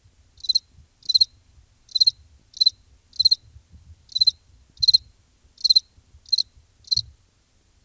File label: cricket